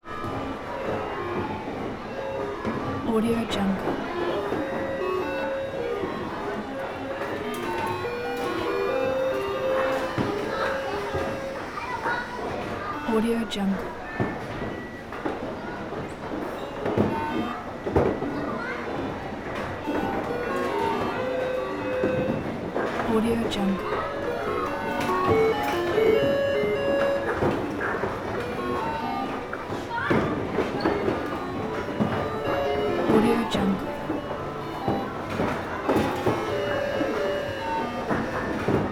arcade game skee ball

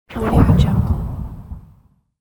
twisting blow whoosh

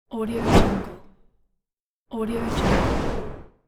whoosh transition; sviridpavlov

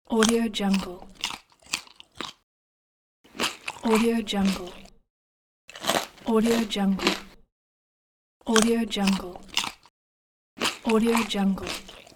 chewing food; carcasound

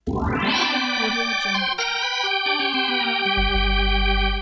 hammond winner videogame; bosone

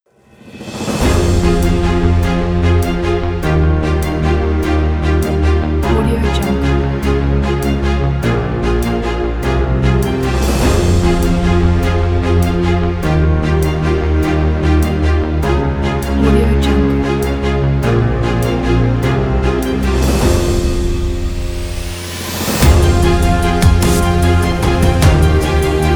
dramatic epic dubstep

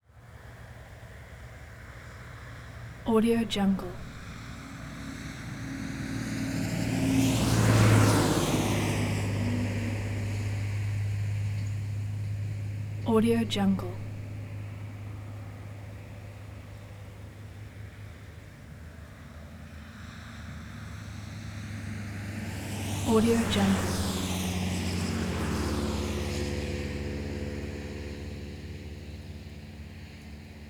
wet traffic approach vehicle pass by

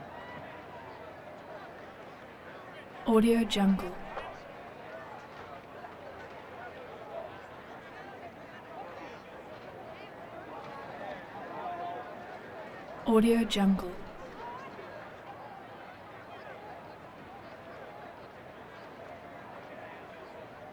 crowd outdoor; prosoundfx

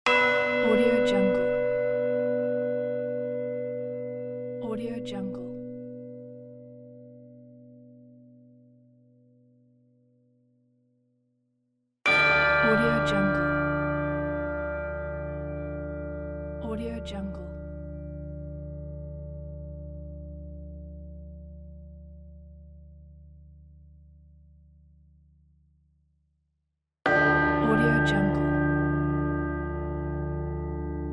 church bells

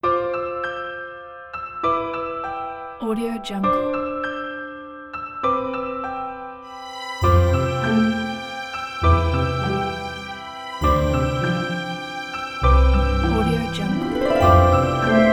tenderness

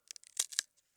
celery twist

